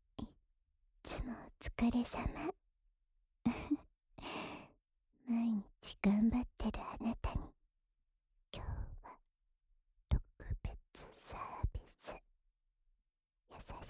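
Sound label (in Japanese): 安眠用耳かきループ（右耳）, ASMR; 【30時間・限定特典も全部収録!】青春べろちゅー委員会♪ コンプリートパック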